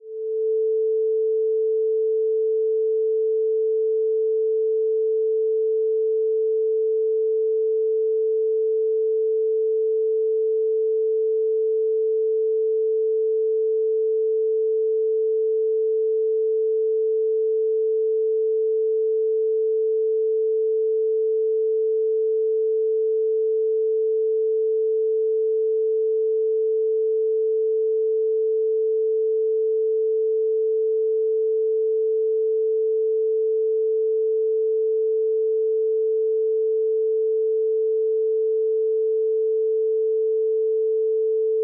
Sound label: sine wave; cubase